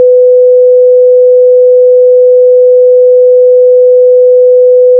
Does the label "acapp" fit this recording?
No